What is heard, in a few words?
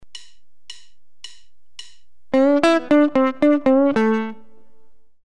Bending in different directions, guitar